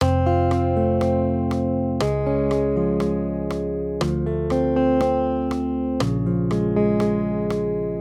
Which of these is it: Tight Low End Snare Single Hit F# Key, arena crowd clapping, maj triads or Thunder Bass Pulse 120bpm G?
maj triads